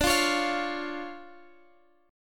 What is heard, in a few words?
D Minor Major 7th D